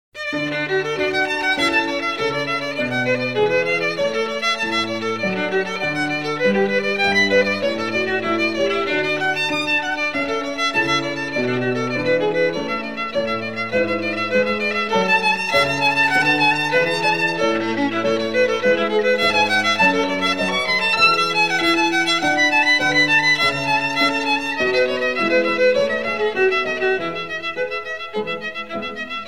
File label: Allegro, violin; Suzuki Violin Method; A. Corelli